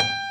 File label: jazz G5 Quarter note